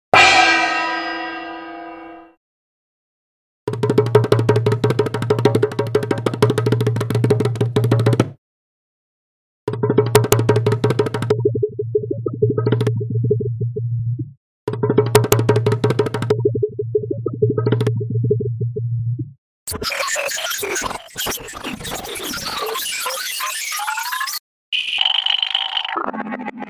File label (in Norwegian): Melodi mix; skranevatnet